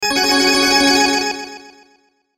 Logo Organ Trill Delay; Sonic Logo; Blastwave

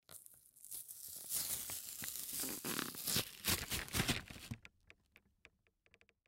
Cardboard Plastic, Cardboard Package Open Slow; Foley; Blastwave

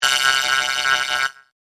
Alarm Warble, Production, Element, Alarm, Warble; Warbles; Sound Design

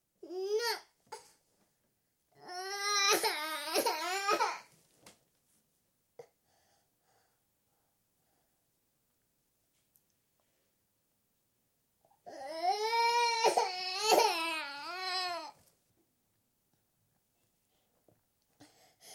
26 month old girl, unhappy in cot (early morning); Babies; BBC

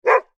animals dog bark med large; Dog Bark ( Medium Large ); Dogs; McKinney Sound

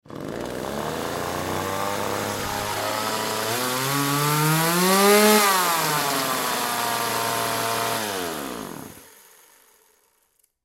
Chainsaw Rev Single; Industry; Saws; Machines; Blastwave

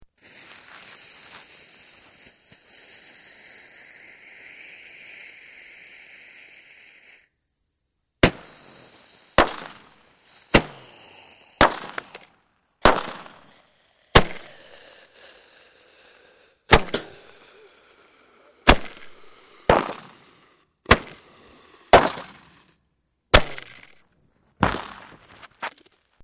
Fireworks Launcher Multiple, Domestic Fireworks

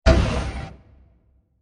Diffuse Impact With Scratches; Blast Sound Effects; Blasts (Sound Effects:Impacts)

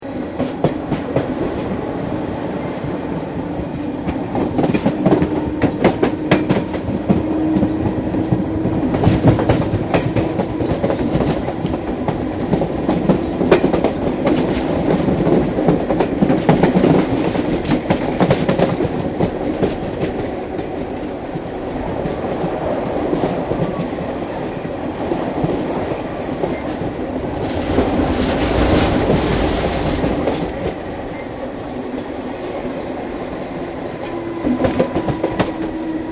Train Melbourne Interior Track Noise; Public Train in Melbourne; Trains; Vehicles

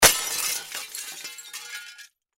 Crash Glass Smash Crash Object; Crash Glass Smash Crash Window Pane; Smashes; Sound Effects:Objects; Blastwave

